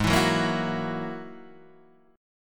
G sharp 7th Suspended 2nd Sharp 5th, Guitar, Open D